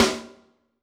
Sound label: Snr, drum